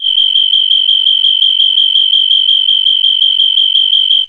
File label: sci fi; Masterbits - Switch FX